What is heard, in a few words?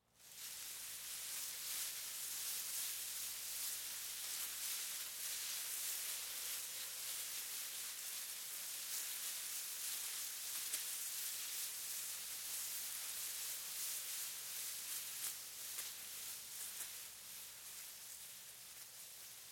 Leaves Tall Grass Wind Moves Rustles; Weather; BigRoomSound